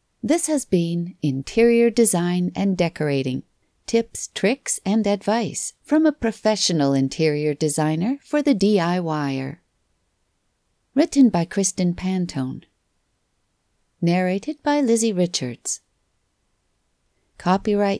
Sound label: closing credits